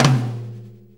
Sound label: 4-4 Tom Flam, Drum Loops